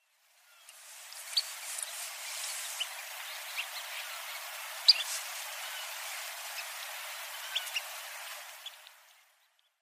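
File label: Grey Phalarope, sendai; koe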